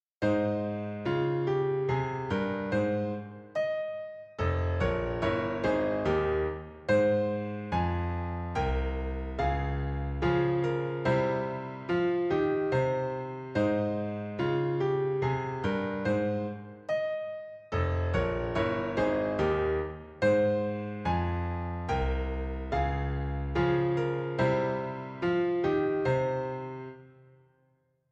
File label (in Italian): Abbozzi per un secondo movimento Adagio di sonata per pianoforte